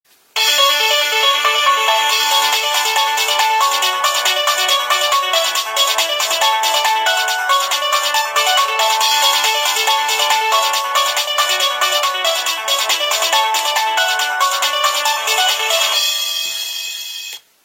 Polyphonic Nokia 1600 Ringtones; Famous

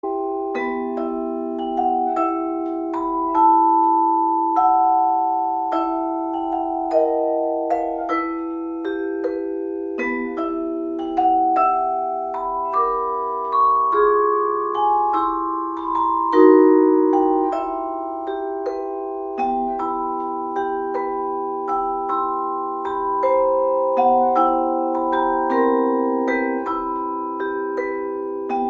Percussion instrument Ringtone; Percussion Samsung Ringtone

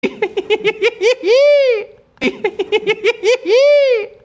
Laugh Ringtone; Andrew Garfield

